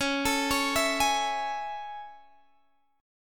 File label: A Major 7th Db